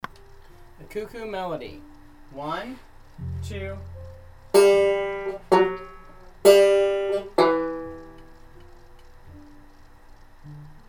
cuckoo mel, banjo

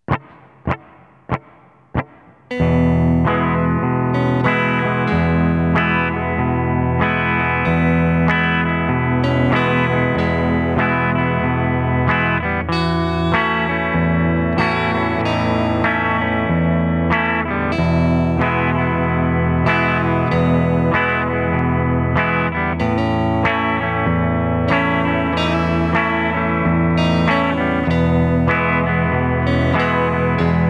acoustic; xmas

